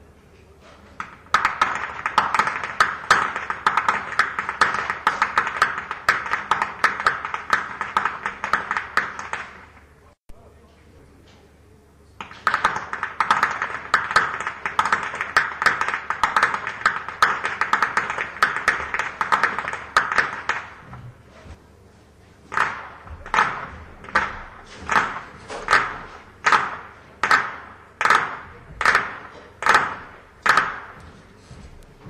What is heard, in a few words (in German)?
schnalzen; sillersdorf; saaldorf